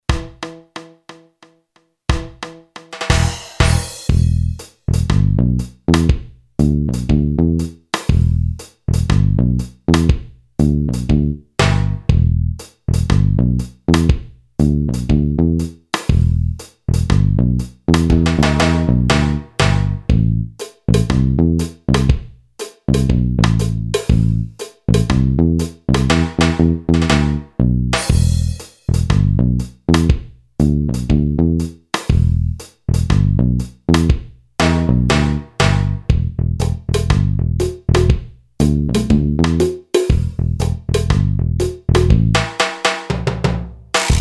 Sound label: REGGAE, Boss DR-3